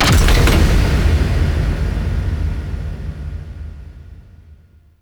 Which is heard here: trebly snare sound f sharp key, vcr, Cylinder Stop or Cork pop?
Cylinder Stop